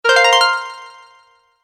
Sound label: Multimedia Alert Sound Effect, Medium Length, Chime; Chimes